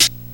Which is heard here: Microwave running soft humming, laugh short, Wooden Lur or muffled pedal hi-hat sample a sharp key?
muffled pedal hi-hat sample a sharp key